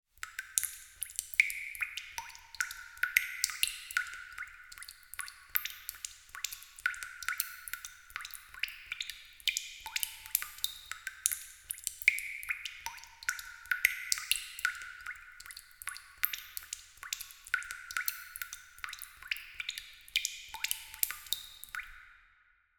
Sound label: water drips reverberant echo cave cavern